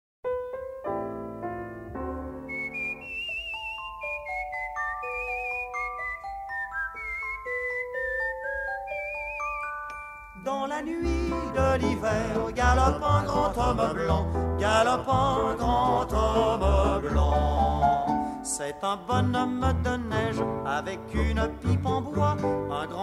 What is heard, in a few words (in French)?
chanson pour les enfants l hiver